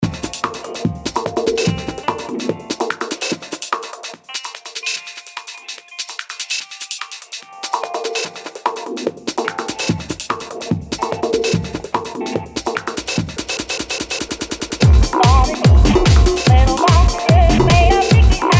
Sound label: Acid Noise